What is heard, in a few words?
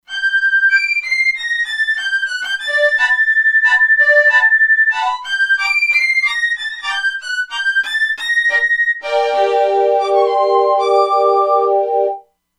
Str Harmonic; Factory Presets; E-mu Proteus-2